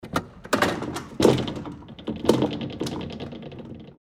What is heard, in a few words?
door sliding close